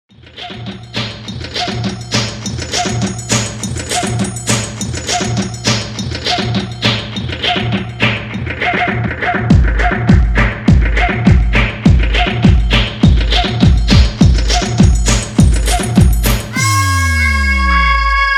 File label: SAMBA (51BPM)